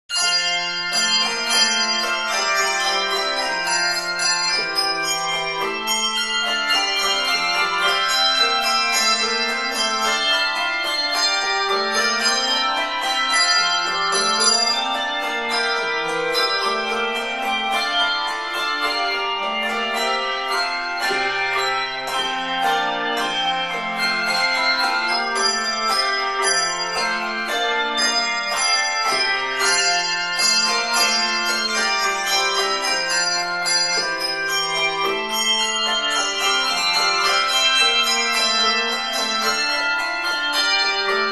concert bell choir god of grace; bob jones university

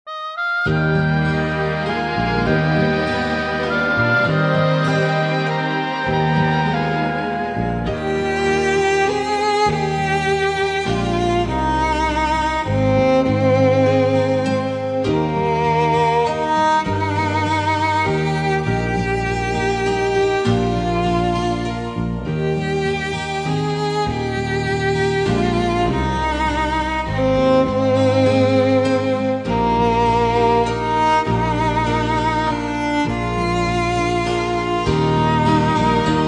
Instrumental Hymns

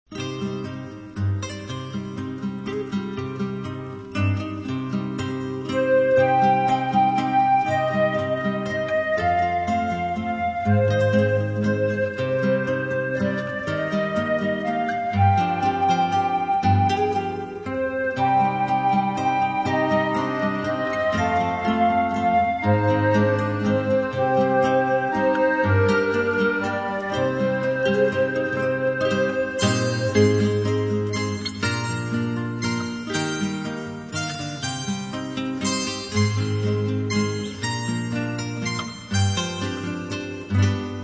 Orchestra, Strings; Majesty Orchestra

